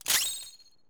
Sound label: turn next; p5r theme